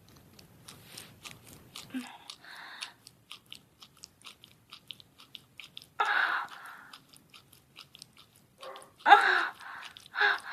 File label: Wet Noises